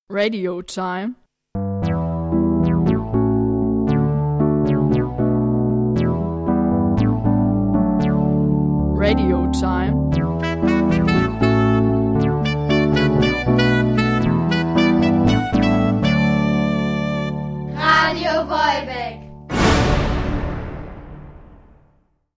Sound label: jingle